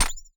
Weapon Swap; SNKRX

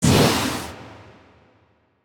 Magical Impact; SNKRX

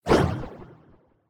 Magical Swoosh; SNKRX

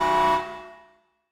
Alert sounds; SNKRX

